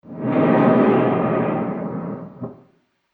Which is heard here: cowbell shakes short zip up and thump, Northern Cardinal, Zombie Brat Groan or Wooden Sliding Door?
Wooden Sliding Door